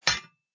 knock; chicken

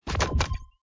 merge car; chicken

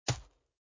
kick; chicken

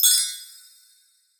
snap; zoom

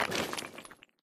brick break; jewels

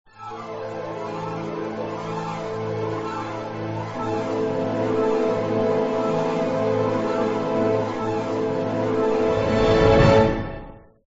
unlock new chapter; gardenbloom

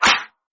Slap

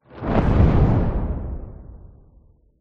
shake boom; 2048

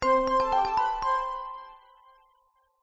goal completed; gardenbloom

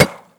metal Destroying; tntbomb

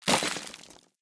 dirt break; jewels

